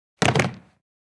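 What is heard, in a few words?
body falls onto wood; Parkour; Butterfinger Video Sound Effects; Additional Parkour Sounds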